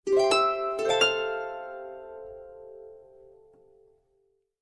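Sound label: funny SMS melody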